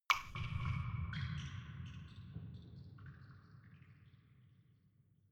dripping

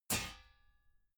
metal sheet crash light

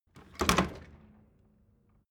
door flap loft impact hard

